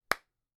Clap

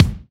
Kick

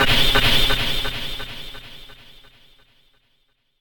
Anime Sword Hit

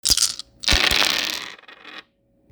Dice

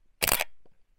Camera Shutter Click

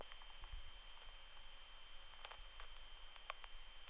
Walkie Talkie Radio Static

